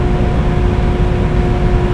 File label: generator; Star Wars Jedi Knight II Jedi Outcast; XBOX; ambience; kejim